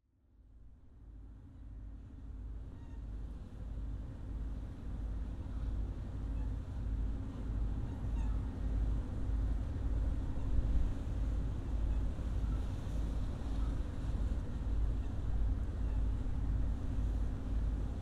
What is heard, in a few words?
wind engine ship; ms arctic hunter